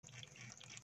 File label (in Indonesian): kepiting